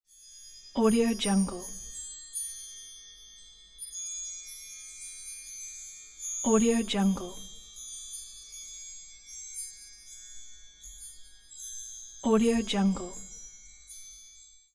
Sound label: tinkling fairy dust